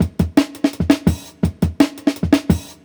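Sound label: Jungle Breaks, 168 bpm; Original Jungle Breaks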